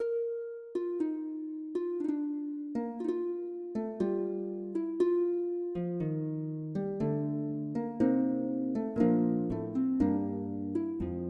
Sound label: Gigue